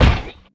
heavy grate, footsteps; player; heavyfeet; Dystopia

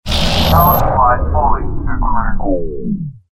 silo power down; Dystopia

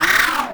turret spin down; Dystopia; weapons

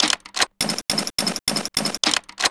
basilisk reload, weapons; Dystopia

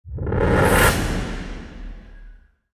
big zap; Dystopia; injection

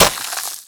concrete impact, Bullet Impact; Dystopia; physics

